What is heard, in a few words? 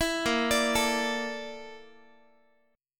A sharp Major 7th Flat 5th A sharp Guitar